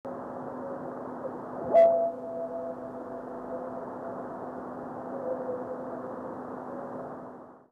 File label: Orionid